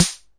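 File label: Vox AC1 RhythmVOX snare drum; drum machines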